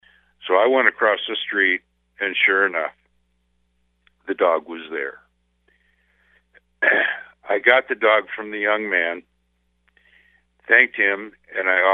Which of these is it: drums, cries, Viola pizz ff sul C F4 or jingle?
cries